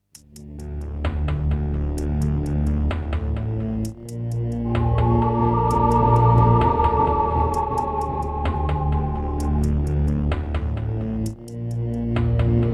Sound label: Diamond Monster Sound; Descent II